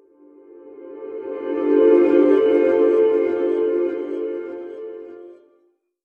relaxing harp sweep

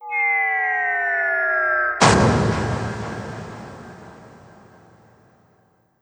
bomb drop impact

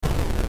retro game sfx explosion

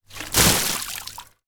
water splash

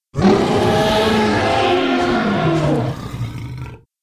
daspletosaurus moan and call

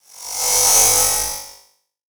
electric whoosh

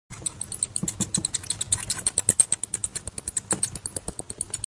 bat chirping

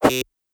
short buzzer sound

